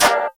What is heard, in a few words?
Narrow Hit; Roland BK-5; Loops & Phrases